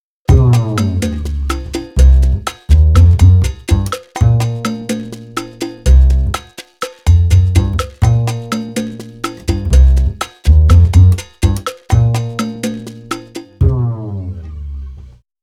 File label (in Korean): Comic 드라마